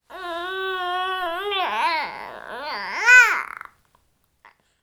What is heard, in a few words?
baby cooing